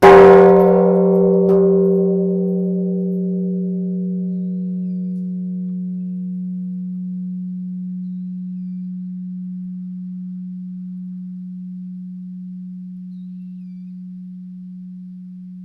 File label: zen bell